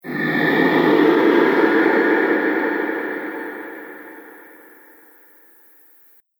Big Dragon In A Small Cave, monster; Fantasy Sound Effect

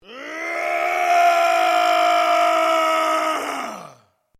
MALE ROAR AGGRESSIVE LONG; male vocalisations; Yelling Sound Effects; Yelling (Sound Effects:Voice)

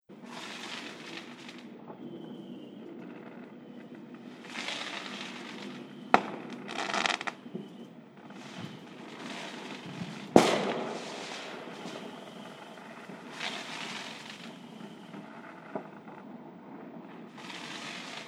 Fireworks Bangs Screamers Sparkles; Andrew Fleming

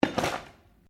METAL ON GRAVEL IMPACT GENTLE

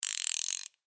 Ratchet Turn, Wrench 19mm Turn Crank Ratchet; Wratchet; Hand Tools; Blastwave

